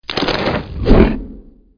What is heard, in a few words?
boulder throw; hero grawl